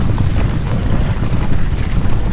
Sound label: horse